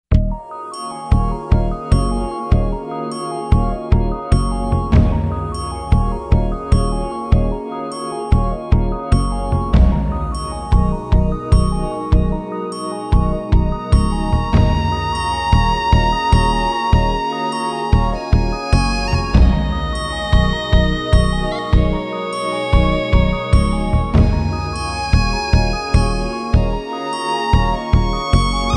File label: Factory